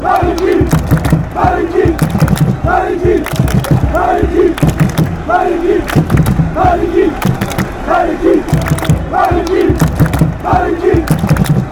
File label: fan chants; malicki